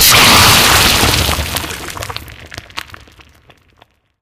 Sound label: object dissolve in goo; Source Engine; Portal 2